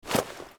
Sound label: jump